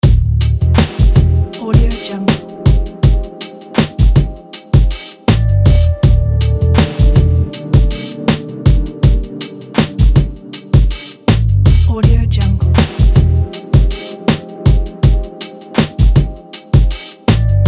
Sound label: atmospheric background loop